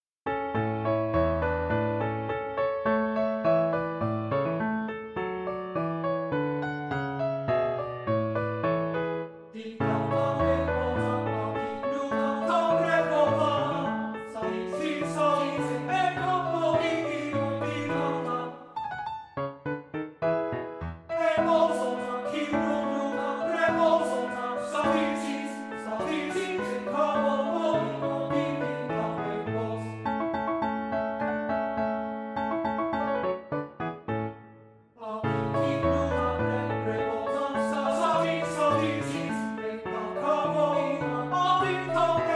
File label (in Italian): Lied per Canto e Pianoforte, Allegro; Koehler; An einem Freund im Mai